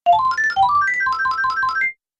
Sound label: Super Bonus Points, video game